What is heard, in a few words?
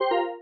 error